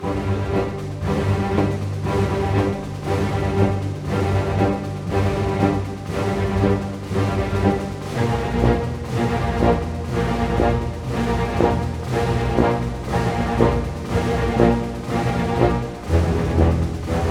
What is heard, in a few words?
SNAR, Snare Army; East West - Scoring Tools